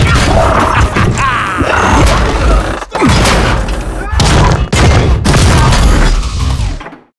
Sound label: biomech finish move